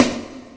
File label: snare, Real